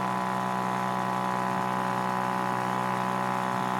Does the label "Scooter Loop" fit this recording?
Yes